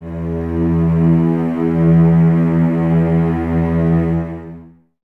E 2, String Ensembles Cellos; Miroslav Vitous

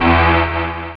DR. LOOP, BUZZ; X-Static Goldmine 3

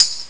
hihat; Amiga Soundtracker Sample Packs

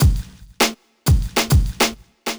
drloop, drumloops, Vinyl Attack; Masterbits

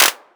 Clap, retro house samples, Drum Hits

